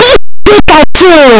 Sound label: pikachu cry; pokeyellow